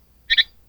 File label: p. fenestratus; Cenbam